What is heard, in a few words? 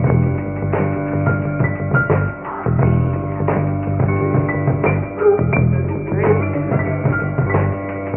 piano, Sad